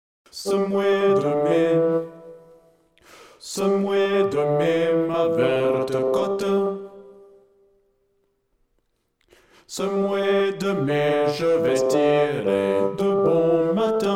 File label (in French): basse; Ce moy